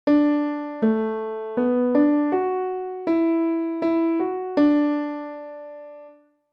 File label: melody